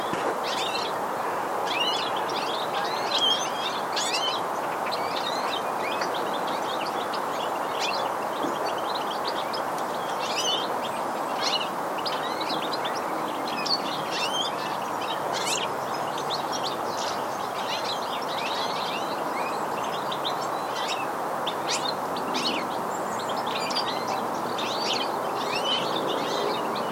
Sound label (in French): chardonneret elegant